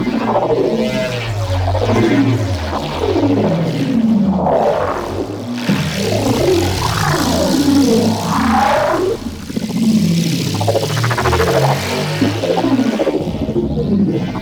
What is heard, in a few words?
Sentient Condrix Portal Loop; Squad Link